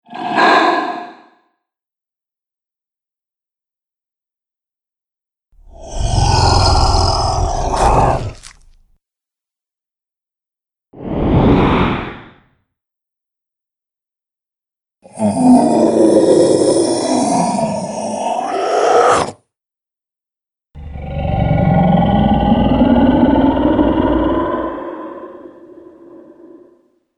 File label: Dragons, monster; horror